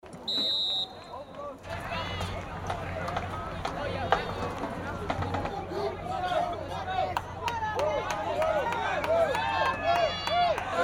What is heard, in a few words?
High School Football; crowd ambience